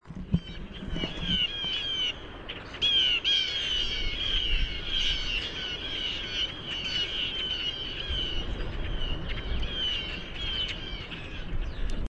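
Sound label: Blue Jay jeer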